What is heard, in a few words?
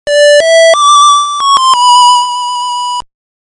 Recorder; LOZ